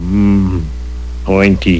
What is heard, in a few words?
pain; quake2; homer1 4